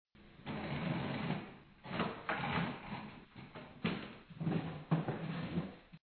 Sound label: Pitcher Pour